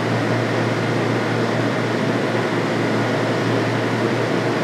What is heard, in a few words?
amb industrial, ambient atmosphere